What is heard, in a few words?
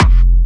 Jump Kicks, Bassdrums